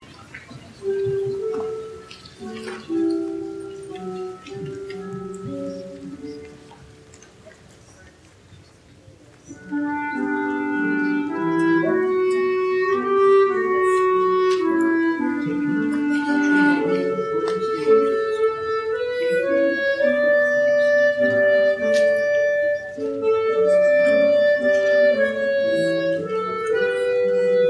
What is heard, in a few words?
clarinet